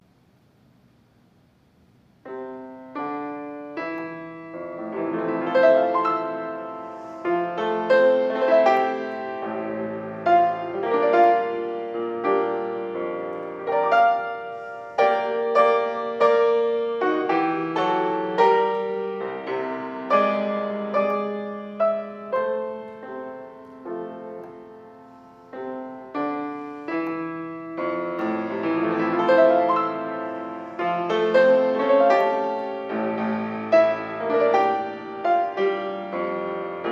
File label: piano solo; Classical; Fine Arts Ensemble